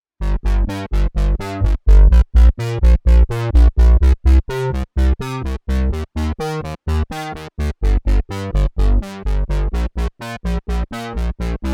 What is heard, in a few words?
Rude Bass